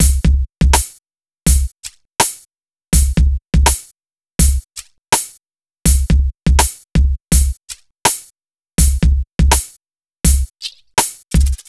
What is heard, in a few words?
E Coast HipHop Drums